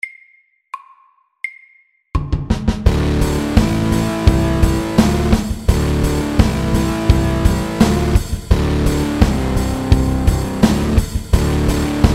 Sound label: Mountain rock SAKTE